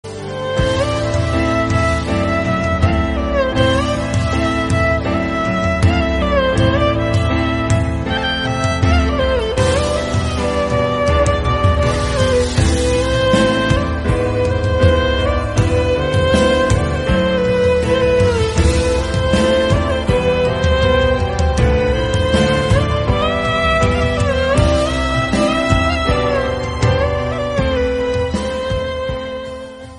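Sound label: background music RINGTONE, INSTRUMENTAL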